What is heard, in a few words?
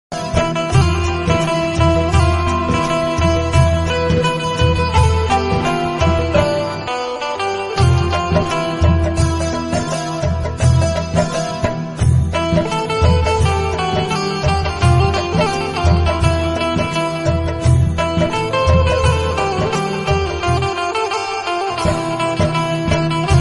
instrumental ringtone romantic old hindi song ringtone 90s hindi ringtone